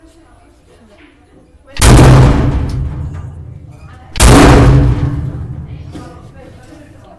go boom; tvattskap

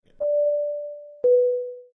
plane call button

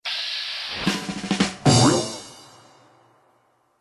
ui perk layer sfx; idiot savant

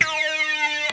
groan tube

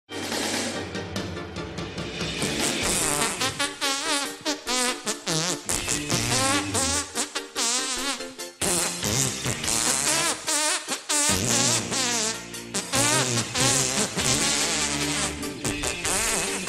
fart

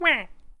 happy quack

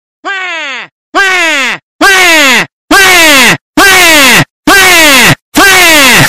wah earrape; waluigi

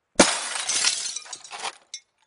glass window breaking

